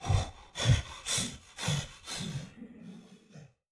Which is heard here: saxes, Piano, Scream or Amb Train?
Amb Train